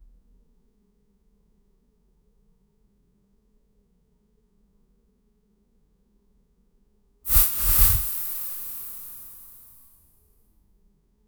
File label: clean honey splash; liquid